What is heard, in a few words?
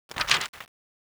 paper; dm cardboard